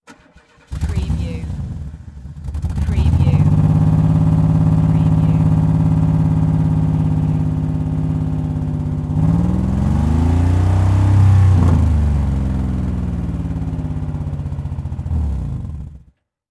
Car Disconnected Spark Plug Start Up Rev Engine Steady Shut Off